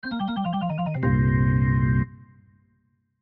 Organ Blues Fill; Keyboards; Musical; Blastwave

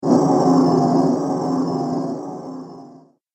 Strange Falling Horror Sound; Scary Soundscape Sound Effects; Andrew Fleming

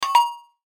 chime digital fuzz; Multimedia; Chimes; Blastwave

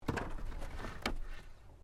Wood Planks Move

